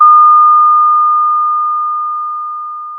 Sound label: Sound of a school bell